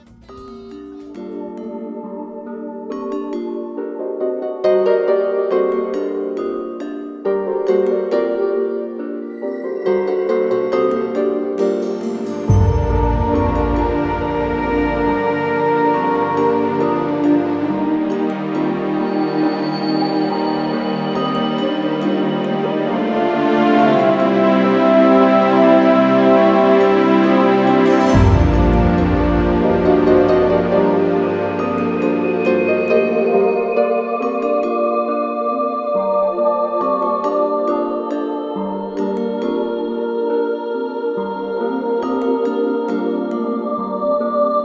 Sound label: Credits Music